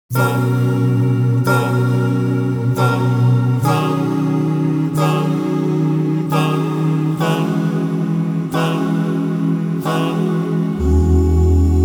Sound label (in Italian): (Alti e Bassi), Gruppi Vocali; guida ascolto